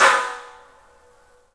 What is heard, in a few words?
STEEL, Drums, ROOM SINGLE; 3D Sound